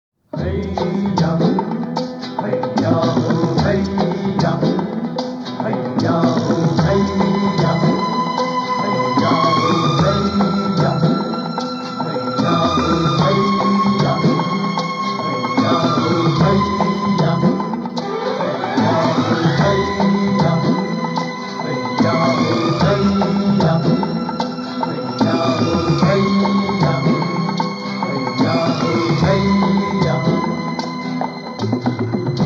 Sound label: Instrumental; Agent Vinod